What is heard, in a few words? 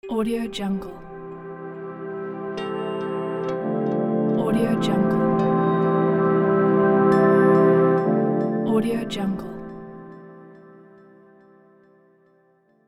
soft logo; andromedasound